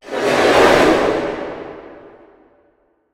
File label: Sword Drag; silent hill homecoming 2008 gamerip pc ps3 x360